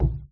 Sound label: ball cushion, pool